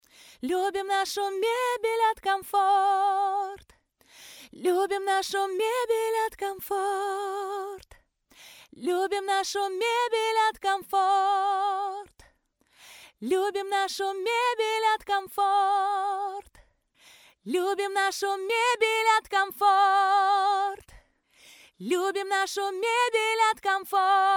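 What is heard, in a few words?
vocal; Komfort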